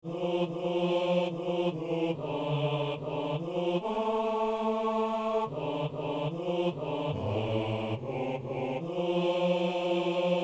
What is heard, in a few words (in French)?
psaume hommes; Psaume 32 (33); Messe du 17 janvier à Saint-Denis